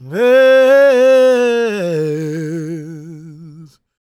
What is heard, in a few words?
GOSPEL MALE; Spectrasonics Vocal Planet